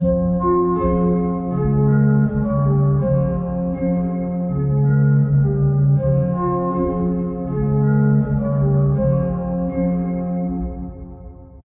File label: the beginning keys 160bpm; looperman; mikemedusabeats